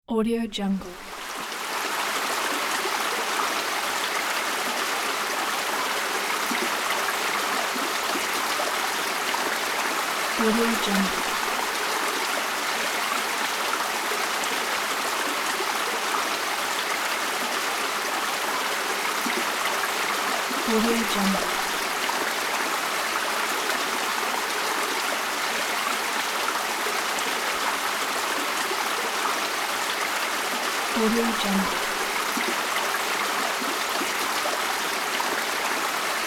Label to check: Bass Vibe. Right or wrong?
Wrong